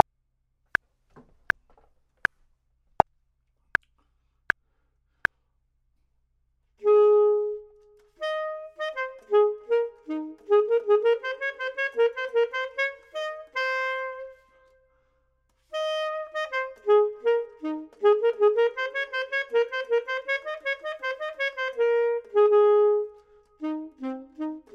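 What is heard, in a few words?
top, Saxophone and Clarinet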